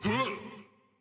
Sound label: Attack; Dinkubus